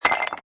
Debris Rock Short, Rock, stone, cynder or brick falling onto other rock; Rock, stone, cynder or brick falling onto other rocks, short debris crash; Lynne Music